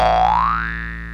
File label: BOING; Syntec; Wall of Sounds; TECHNO-BOX